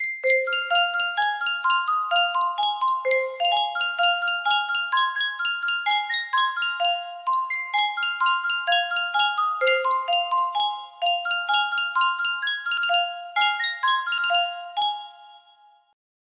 yunsheng music box, melody

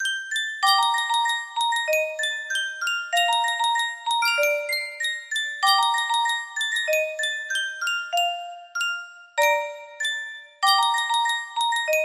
Sankyo Music Box, music box melody

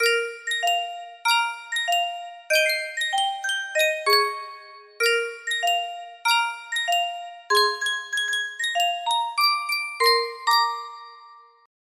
sankyo music box, melody

